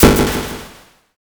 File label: thunder magic